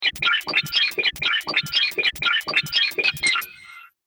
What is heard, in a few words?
Sci Fi Spaceship Radio Bad Connection, Weird Alien; Communication; Weird Alien Noises; Communications; Crafted Signals